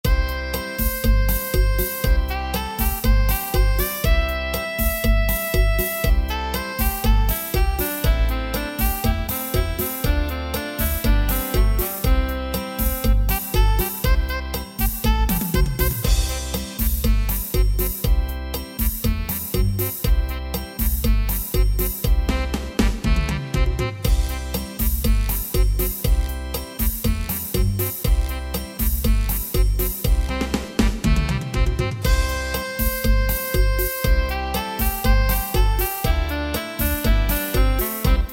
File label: Lae C maj